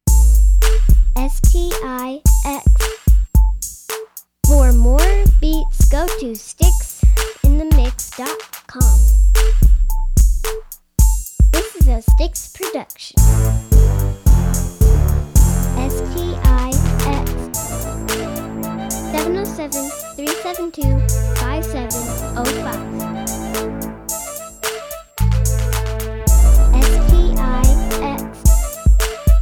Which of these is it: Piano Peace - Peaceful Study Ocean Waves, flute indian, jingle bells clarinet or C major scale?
flute indian